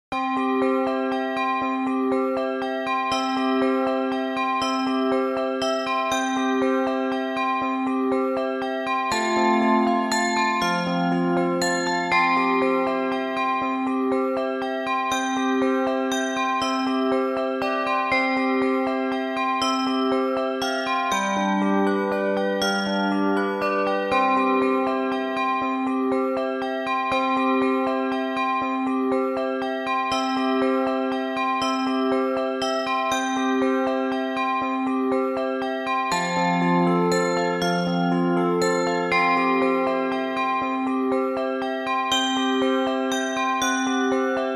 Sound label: o holy night pour clochettes; en do majeur; en anglais